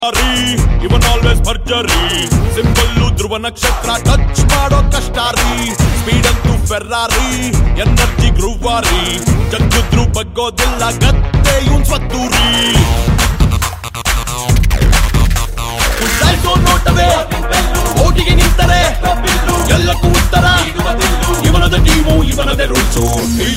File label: Kannada Ringtone